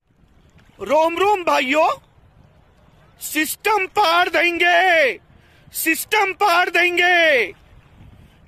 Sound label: haha